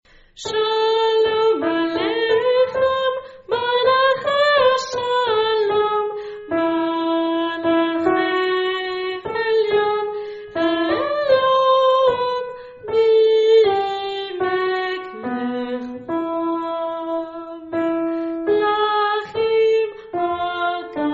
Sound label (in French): hommes; shalom